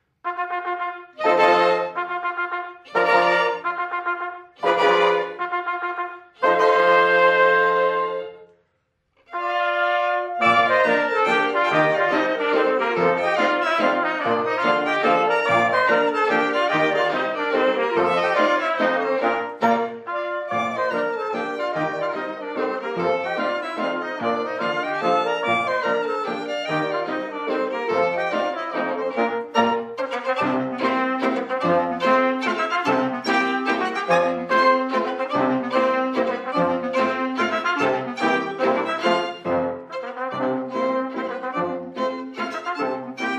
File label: Mazurka